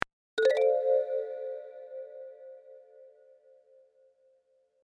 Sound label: bonus